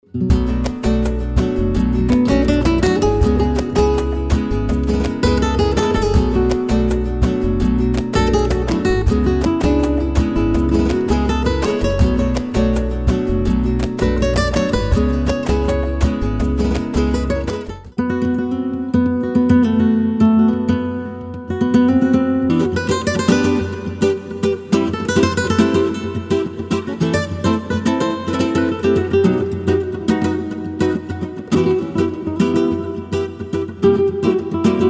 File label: Flamenco Guitars